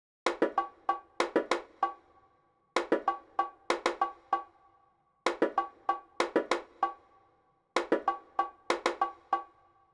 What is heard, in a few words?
Bongos, 096 Cmin; Hip Hop; Hip Hop Exotica; Apple Loops; Big Fish Audio